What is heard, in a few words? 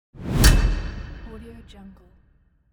swoosh metal